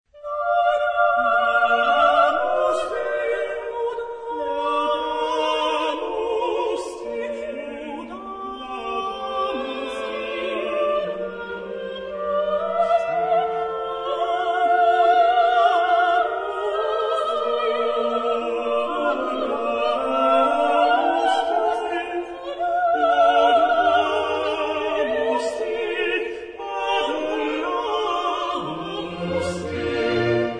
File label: chorus; Mendelssohn; Gloria in Eb; Laudamus te